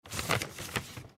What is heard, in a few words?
paper